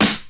old school hip hop steel snare drum sound g sharp key